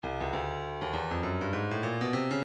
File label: breakbeat 100 bpm; Stylus